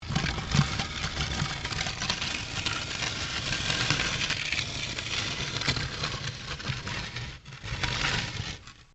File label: Stone On Stone Large Scrape; Material components for constructing more complex sounds; Scrapes